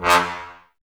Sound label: brass, Tbs Mute; 90 s Sample CDs; Miroslav Vitous; Woodwind Brass Ensemble